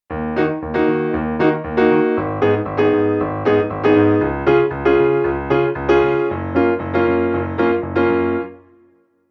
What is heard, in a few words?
disco